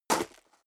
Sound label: step high heels gravel medium single stair up; schritte; Footsteps; menschen